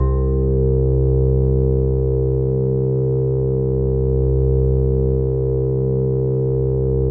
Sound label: BASS C2, SYNTH, Trance; AMG; Global Trance Mission VOL-2